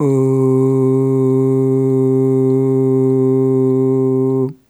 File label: Ooh Long C2 Dry; Harmonic Building Blocks